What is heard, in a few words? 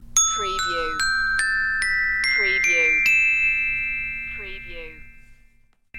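Xylophone Ascend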